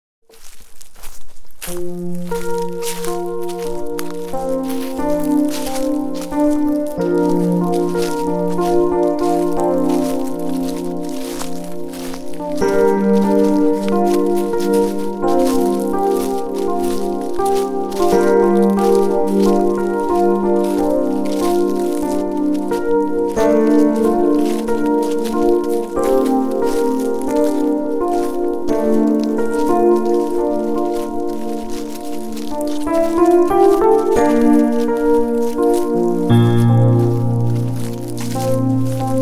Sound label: Relaxing Sounds of Nature, Birds